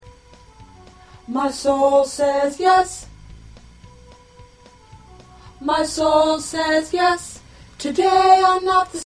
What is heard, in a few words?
Tenor